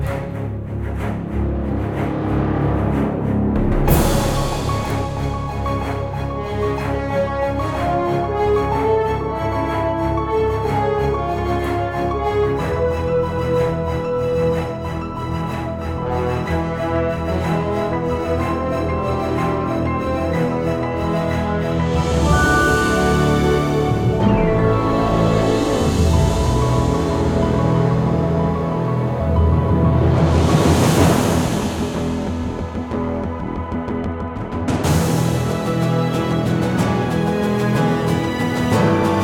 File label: boss reward loop; roguebook